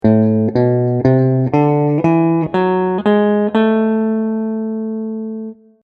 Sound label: melo minor scale